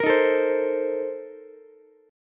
A sharp Major 7th Suspended 2nd Suspended 4th, Ukulele; strummed; JGuitar